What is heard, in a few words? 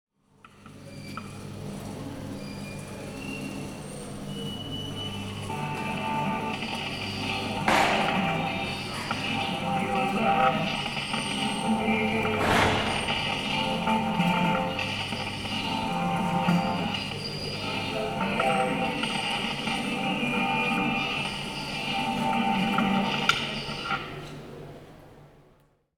Basel Tinguely Museum; MS ZOOM